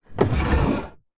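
household furniture large quality wooden sideboard drawer open; sidebaord; zapsplat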